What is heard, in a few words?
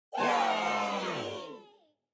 audience yay